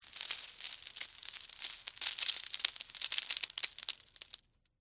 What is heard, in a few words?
Texture, Debris, Spill, Sugar, Cone, Waffle; Debris Sound Effects